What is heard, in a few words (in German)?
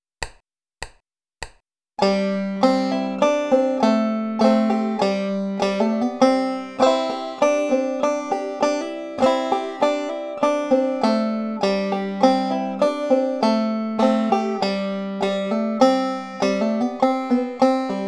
arkansas traveller mit hammer on pull off roll und triole